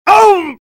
spy pain severe; svencoop addon; tfc; player

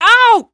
alyx hurt; svencoop addon; playersounds

